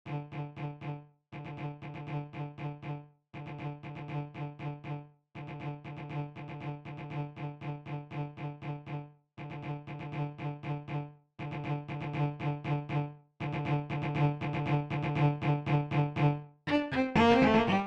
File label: Bass; Samson and Delilah